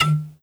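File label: shells eject tube, super shotgun; weapons; doom; ssg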